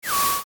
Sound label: retro; maoudamashii